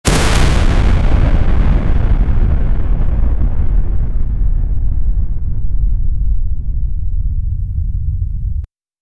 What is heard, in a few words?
ball of fire charging up, raging